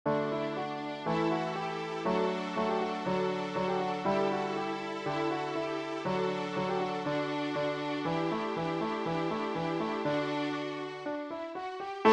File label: Chipmunk Chatter Score